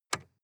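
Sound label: sports car interior lightswitch; verkehr transport; autos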